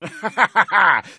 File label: laugh